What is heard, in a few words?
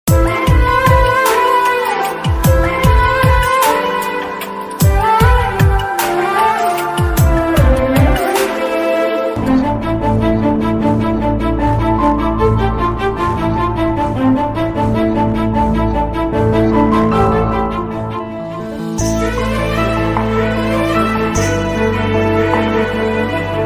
odia bgm ringtone; odia song; odia whatsapp status